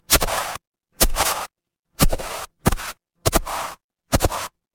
footsteps snow

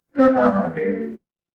feedback fail

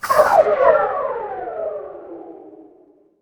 magic scifi laser

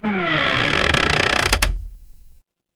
door creak

